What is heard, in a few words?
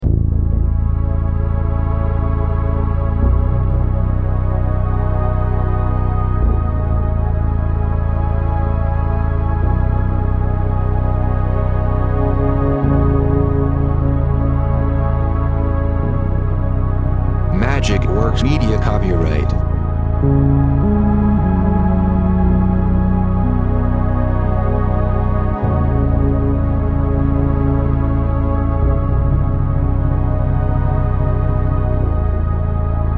chillout elektro